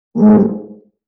Viola String Finger Rub; Vintage Cartoon; Musical Elements

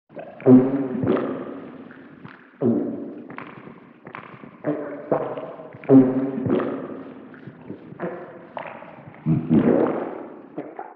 Human Swallow With Reverb; Vintage Cartoon; Comedy Vocals

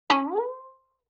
Violin Pluck Pitch Rise; Vintage Cartoon; Musical Elements

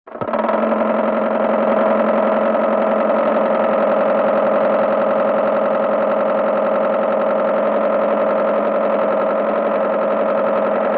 Comedy Motor, Vintage Cartoon Foley

